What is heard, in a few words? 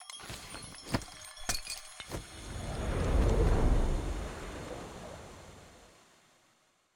fast travel; B Path of the Teal Lotus